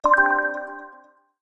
assist; NovaRealm; notify

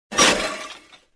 break; Cosmic Rage; ships; Ratika

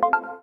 report, notify; NovaRealm

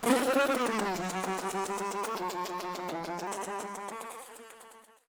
fly go; Trash collection; Cosmic Rage

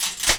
handle; Combat; Cosmic Rage; The Experience-light; ships

